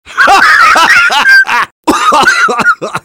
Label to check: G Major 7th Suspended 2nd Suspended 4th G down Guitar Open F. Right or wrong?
Wrong